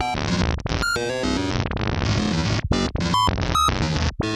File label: ELCTRO INCOMING TRANS, communications